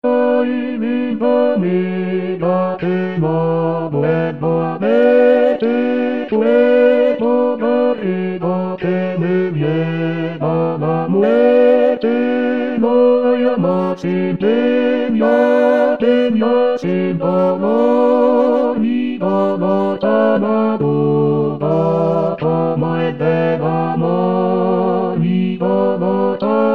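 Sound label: bass; Ay Linda Amiga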